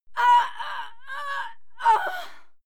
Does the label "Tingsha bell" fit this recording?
No